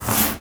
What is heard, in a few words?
Rubbing Scratching Mur Crepi